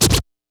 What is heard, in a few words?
kit, Yamaha Motif-ES8